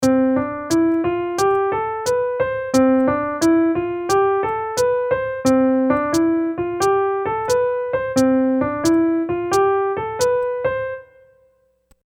Straight versus swing scale

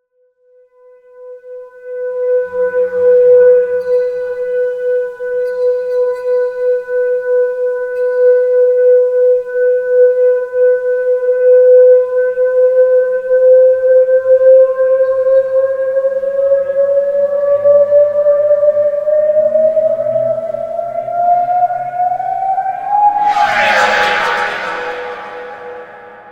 YAMAHA FG TA ebow

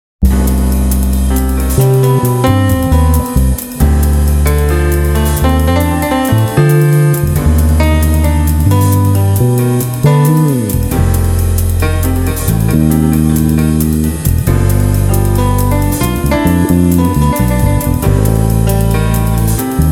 Melodic minor groove, bass; a bassists guide to modes

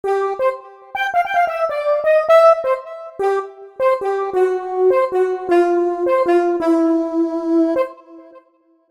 Soprano sax n synth voice

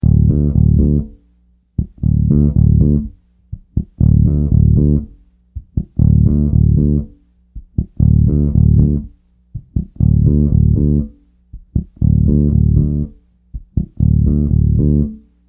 Octaves

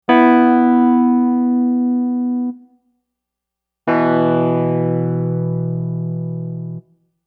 Wurly Power Chord

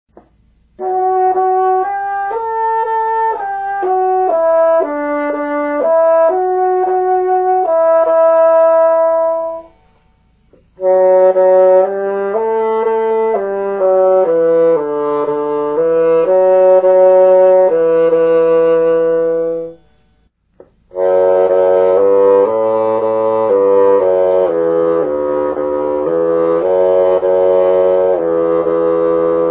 Bassoon

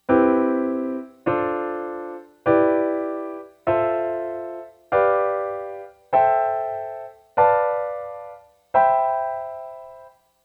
Major Scale 7th Chords

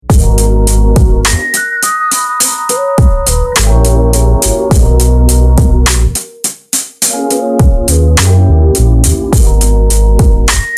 RB, bass, groove; how to groove in seven different musical genres

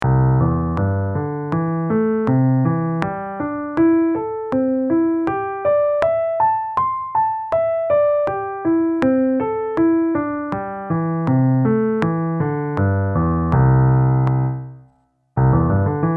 Slow n Fast

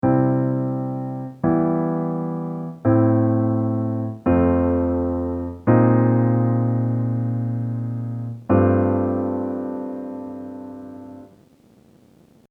Chord Progression C

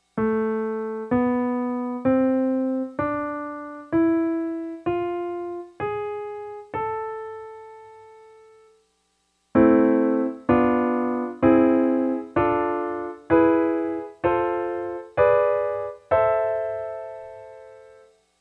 Harmonic minor scale triads

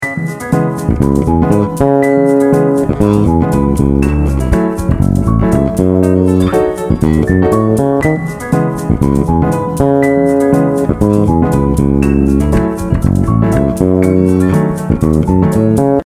Emajor, C#mi7 – F#mi7 – D#mi7♭5; bass; substitute the vii chord (D#mi7♭5) for the V chord (B7); a bassist's guide to chord substitutions

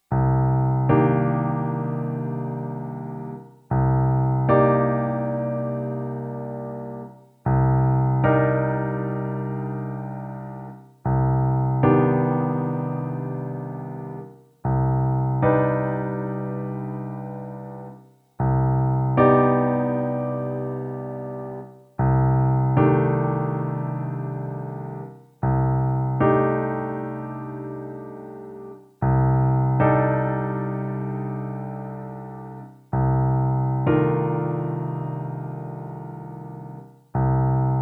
C minor rootless

